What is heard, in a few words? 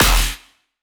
Bass One Shot Gunshot E; XLNT Tear-Out Vol.1